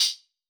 Crisp Chuf, Hats; Airwindows

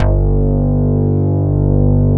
SLAM; Greytsounds - Scott Peers Sound Engineering

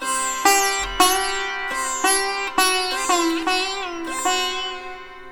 SITAR, Indian Traditions

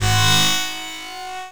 DANCE FX; Best Service; XXL Production Effects; Sample CDs

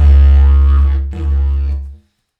Didgeridoo Kit Samples

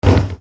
Percussion, EuroDance; Best Service; Ethnic Journey Sound Cube; EuroDance Essentials

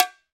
E BONGO S, World Percussion

